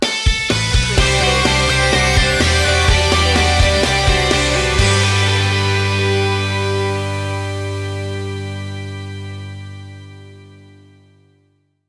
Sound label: take flight stinger